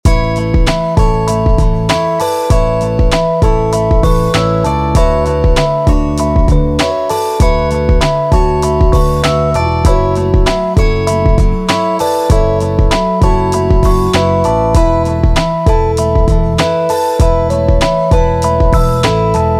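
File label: harmonic rhythm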